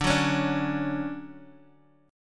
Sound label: D Major 7th, chord